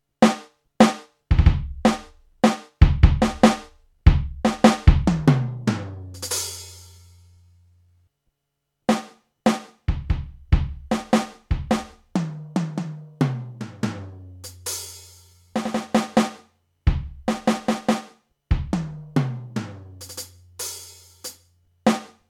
Millenium Nona Pad Rock Kit Reverb

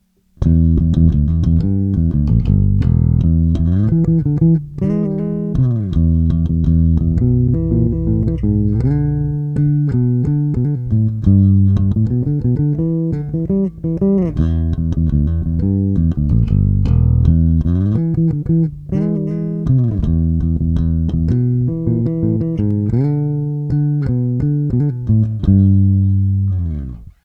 Warwick DI Pre

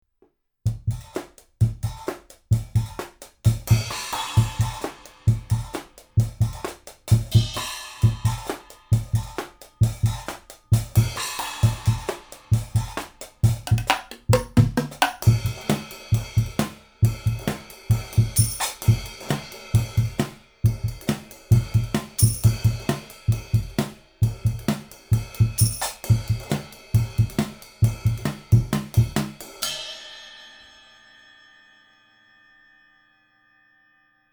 Drums R1ST

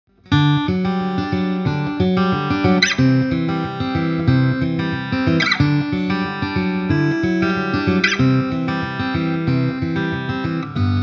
Takamine Thinline Fingerpicking; Takamine TSP158C STR

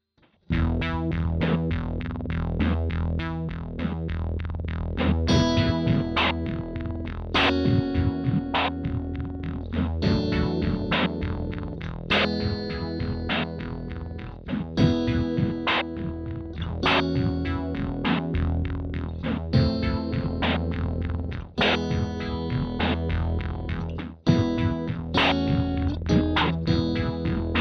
120 BASS SEQ RHYTHM

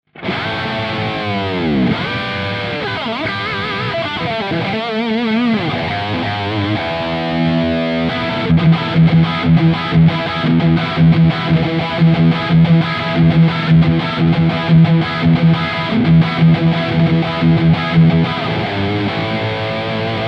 Hot Amp; Gojira Plug-In Suite

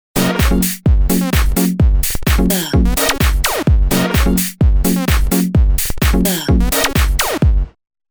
Deephouse; Zoom ARQ

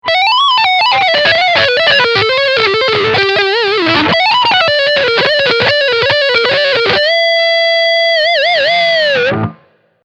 Front humbucker Lick

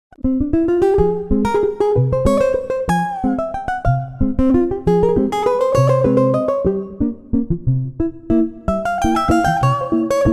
Rustic Guitar Pluck; Cypher2; FXpansion

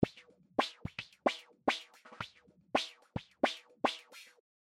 Drums Filter

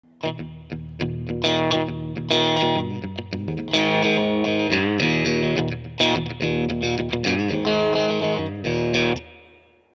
Ampeg Combo Light Reverb

